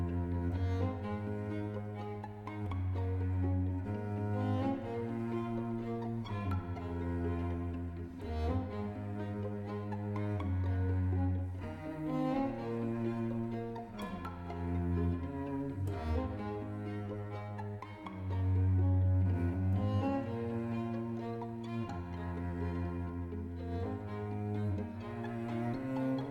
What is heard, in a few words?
strings quartet; TND Crow Strings EP